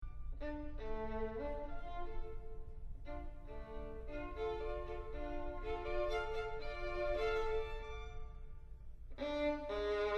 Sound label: violin